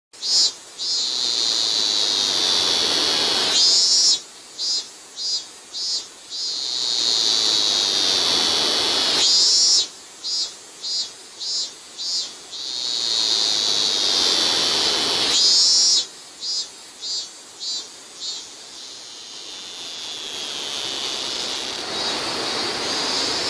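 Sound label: sound of cicada in Japan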